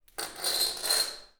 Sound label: Glass Scrape; 99Sounds; The Warehouse